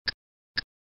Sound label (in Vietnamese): Dong ho tic tic